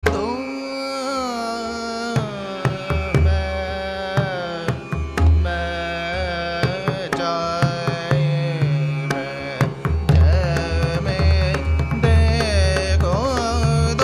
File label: DHAMAR Hindol; North Indian tala cycles